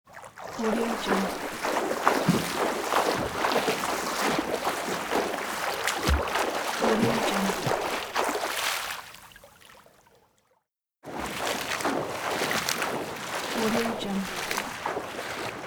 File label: swimming constant splashing in pool